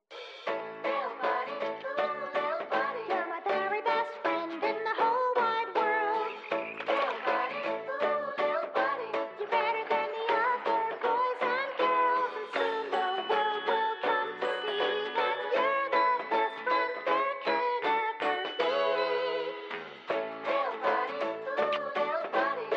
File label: Commercial Song